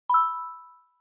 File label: notification; CTFd